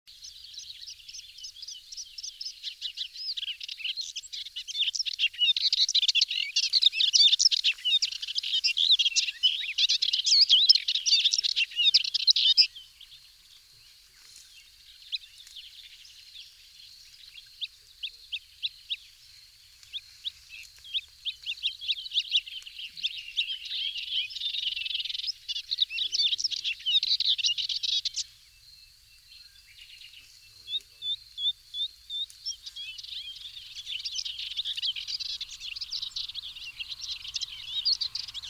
Hipolais polyglotte

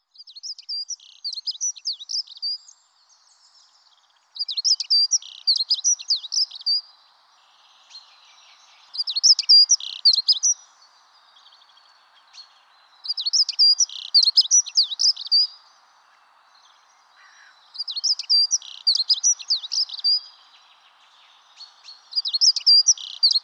Accenteur mouchet

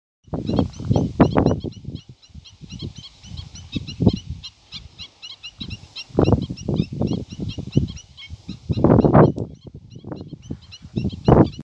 Echasse blanche

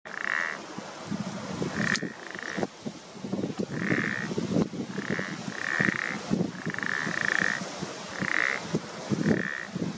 pelodyte ponctue

